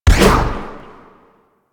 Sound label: Jump Projection; Footsteps; Warframe; Tenno; Wall Run